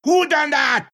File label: pain